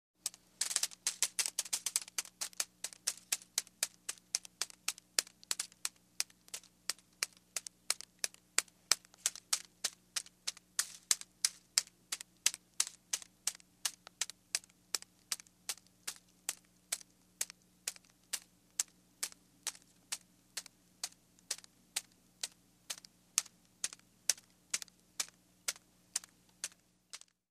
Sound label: Drips Splat Leaves